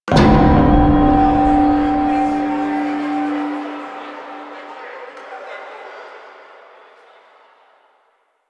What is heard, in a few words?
memory chime hit; Warframe; Quests; Apostasy